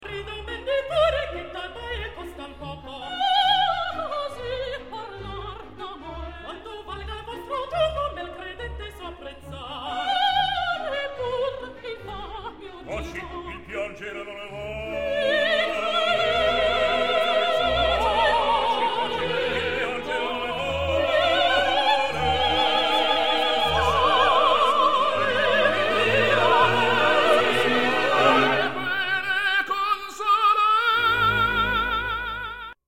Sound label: rigoletto quartet